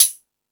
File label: Rap Session, Drum Machines E-MU Pro-Cussion